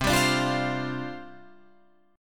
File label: C Suspended 2nd Suspended 4th, down, Guitar, Drop D